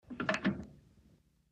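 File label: British Call Box Receiver, pay phones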